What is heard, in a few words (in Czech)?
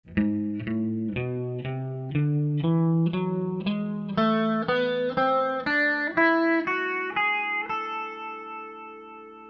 Ami melodicka, kytara